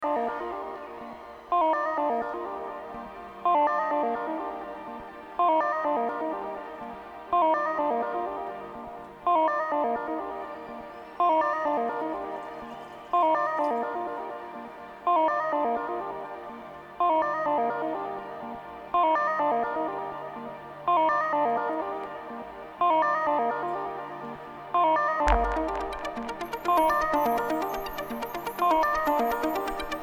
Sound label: Modular Improv